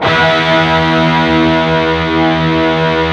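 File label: PRS power chord F 3 R, guitars; PWR CHRD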